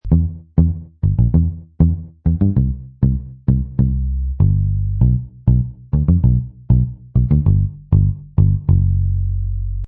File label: Muted Bass Em 98